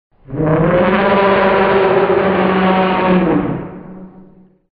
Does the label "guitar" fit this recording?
No